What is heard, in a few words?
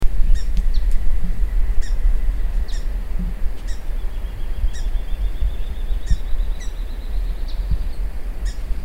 Brazilian ruby; پرنده یاقوتی برزیلی